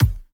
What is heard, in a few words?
reels stop; DinoReels81WD; wazdan